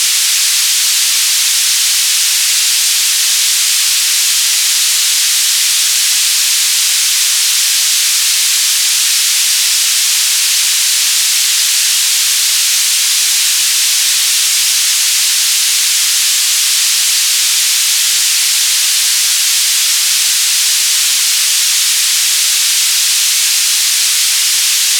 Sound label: static